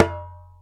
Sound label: Ashiko Slap Go